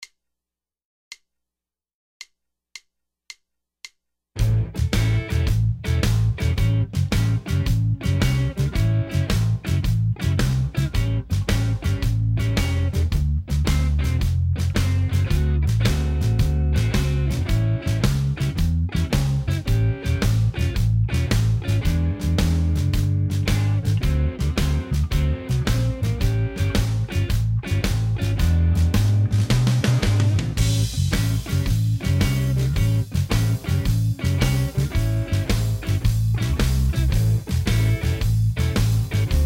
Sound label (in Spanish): Blues mayor shuffle en A